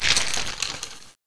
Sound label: die, skeleton